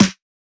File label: Snare, Dance Drum Kit; 99Sounds x Monosounds; Twilight